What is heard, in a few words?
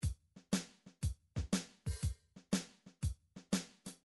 drums, midi drum patterns